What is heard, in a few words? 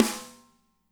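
medium snare, natural kit; Spectrasonics; Burning Grooves CD2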